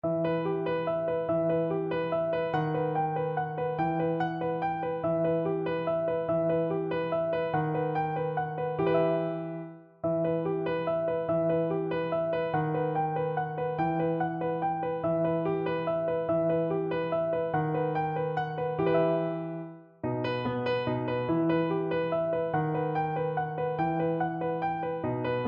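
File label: waltz in e minor piano; carulli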